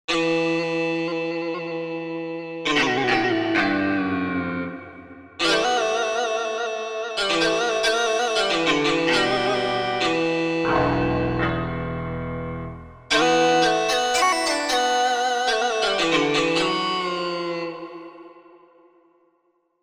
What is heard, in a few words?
Lead Guitr; Korg MSC-1S Variety